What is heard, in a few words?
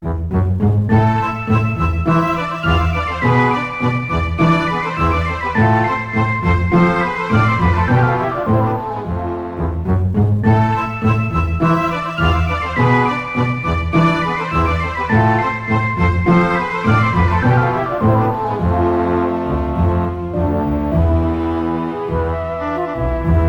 Music Classical cafe